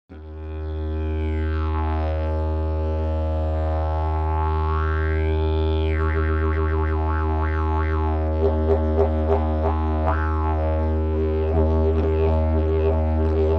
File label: didgeridoo; jilm hnedozeleny